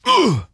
Wound Light; Putress

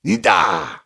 Attack; Voljin

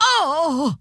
Wound; Creature; Auriaya

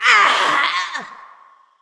Wound Medium, Creature; Fjola

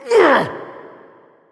Hood Wolf Wound Crit

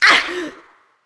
Wound Heavy, Creature; Eydis

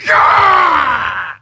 Wound Critical; Jaraxxus

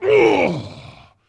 Wound Crit; Captain Rupert

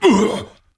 Wound Medium; Varimathras

